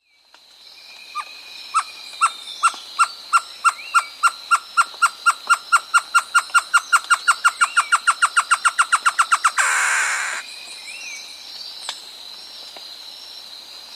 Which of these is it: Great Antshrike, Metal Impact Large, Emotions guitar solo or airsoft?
Great Antshrike